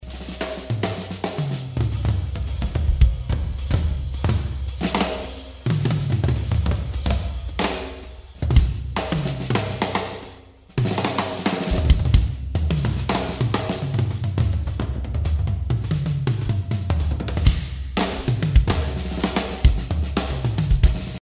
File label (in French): batterie extrait